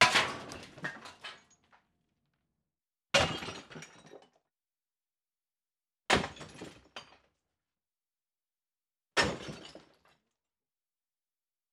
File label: Smashing Toilet